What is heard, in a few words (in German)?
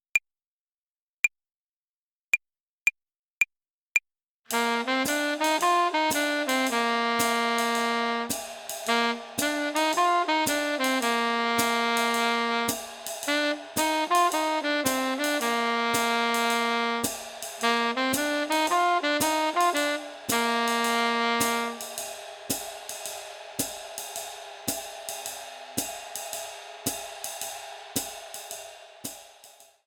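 Swing Phrasierung Übung; play along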